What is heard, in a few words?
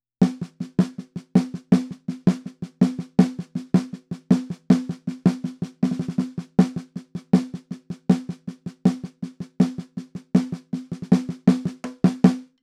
perc bridge snare